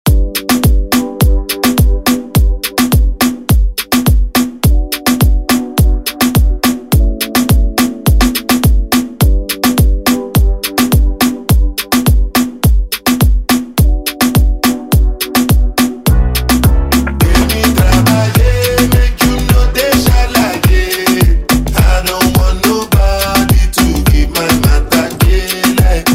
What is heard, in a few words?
afro redrum; Deejay Hit